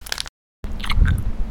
Candy Chew

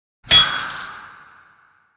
Jar Break

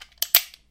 Rev Cock

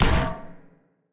Glass hit

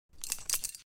Medkit Equip

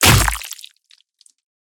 melee kill; SCP-096

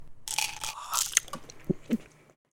Painkillers Use

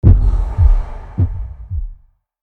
Attack Hit; SCP-049

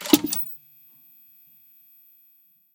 Map Toggle On

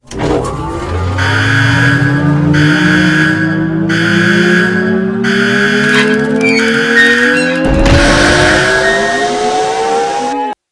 Warhead Crank

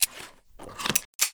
reload

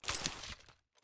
AK Inspect

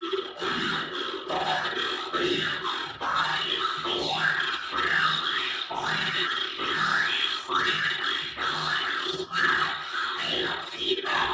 Running

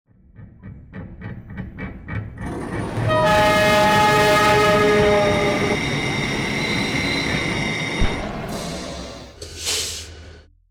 Train Enter

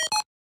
Ping

